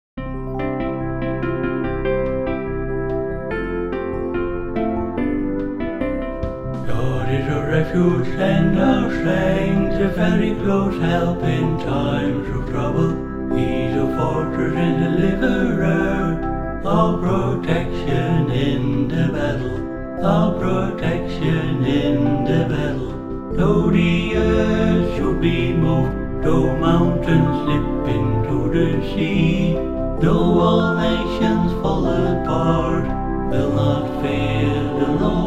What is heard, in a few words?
two-part; New Hope Music